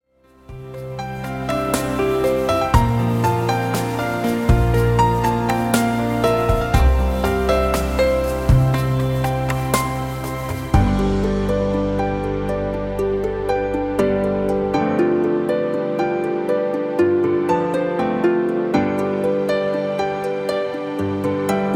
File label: Relaxing